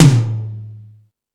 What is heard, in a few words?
Metal Drums, E-MU Pro-Cussion; drum machine